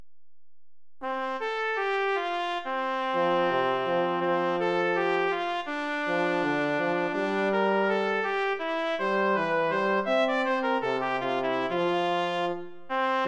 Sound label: jingle bells; pierpont james; the one horse open sleigh